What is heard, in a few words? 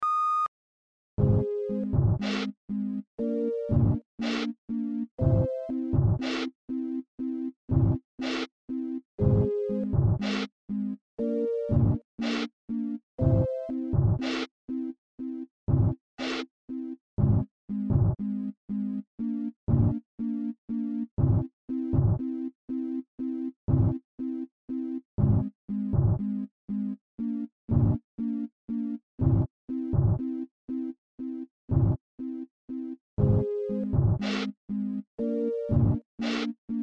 sinewave